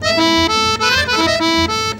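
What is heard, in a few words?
accordion groove; Best Service; World Colours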